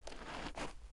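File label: shuffle, footsteps hard surface jump up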